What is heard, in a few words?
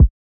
Kick